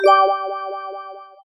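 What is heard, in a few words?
car repaired; The Wild Thornberrys Movie; kocsimegjavit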